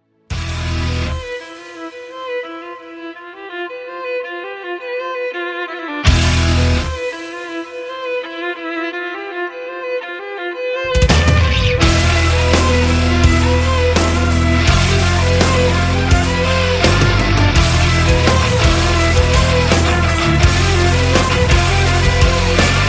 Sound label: horror ringtone; harmonyos